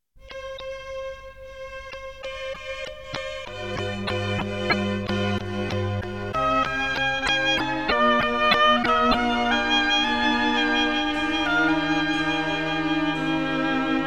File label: oboe; marcello; adagio pour hautbois